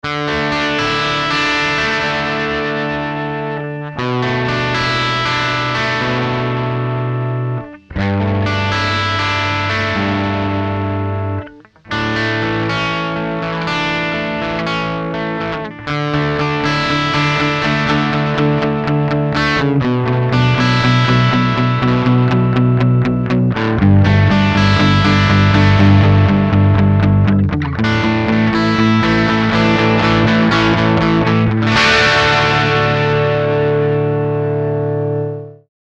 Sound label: Classic Rock